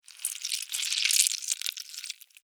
Larva Queen Eggs, Larva, Queen, Lay, Egg, Movement, Slimy, Wet, Insect, Bug